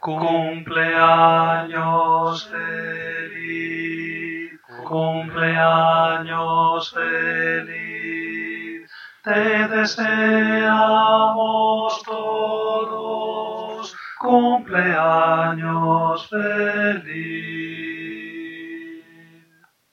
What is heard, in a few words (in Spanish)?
Cumpleaños feliz cantado Ten